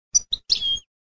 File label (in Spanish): chipicolios, campero